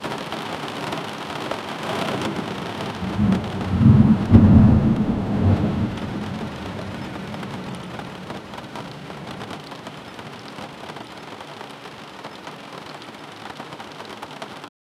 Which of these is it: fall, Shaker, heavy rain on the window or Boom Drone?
heavy rain on the window